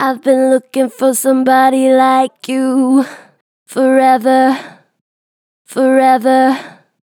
Vib Ive been looking for somebody, Vocals, Natural Vibrato Strong; Harmor Vocals